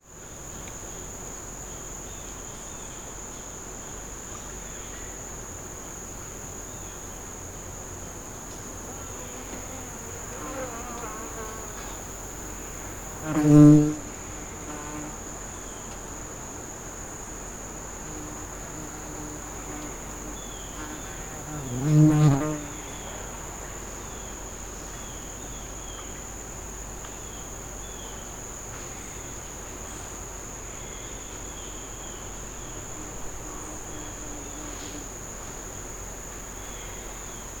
nature forest ambience birds flies buzzing close to microphone; 3pm